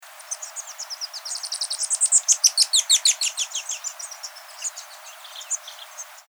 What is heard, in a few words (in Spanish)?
Colibri cordillerano Oreotrochilus leucopleurus